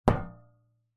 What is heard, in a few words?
impacts metal small road sign knock hit; zapsplat